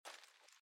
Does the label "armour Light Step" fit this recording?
Yes